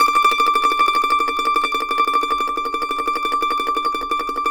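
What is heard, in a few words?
mandolin tremolo D6; Sampleheads; Whole Lotta Country 1 & 2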